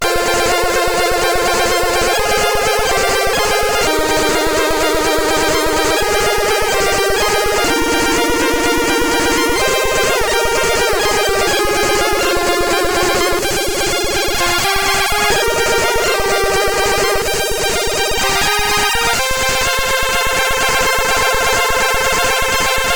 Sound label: High Trance, CSG8580R5